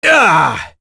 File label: Vox Attack; Roi; kings raid; voices heroes en